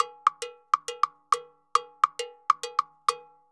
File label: Timbaleta 136; Best Service; Festa Latina VOL-1; Drums & Percussion